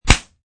Martial arts - slap; Slaps; Combat; Slap Sound Effects

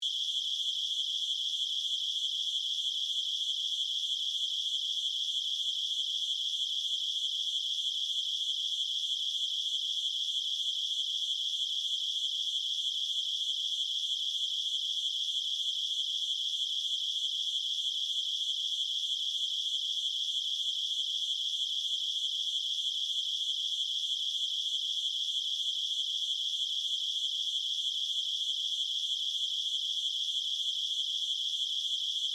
Animal, Ambience, Insects, Chirps, Night, Bugs; Environmental Sound Effects; AmbNightCountry

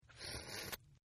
Slurp Female, Drinking; Sound Effects:Human; Partners In Rhyme

